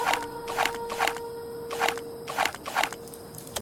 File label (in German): aufnehmen; return to mysterious island